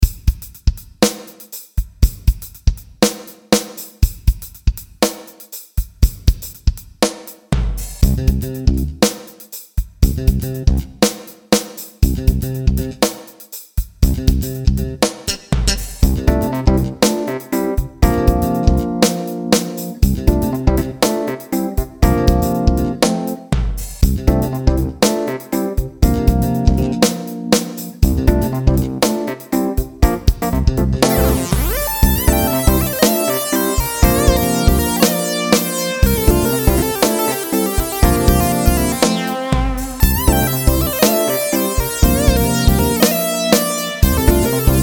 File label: band little synthsolo mit solo